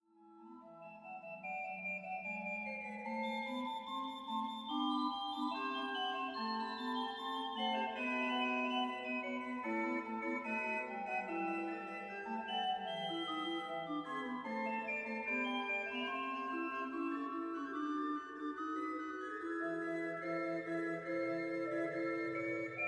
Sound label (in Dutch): Hinsz-orgel klankvoorbeeld; Appingedam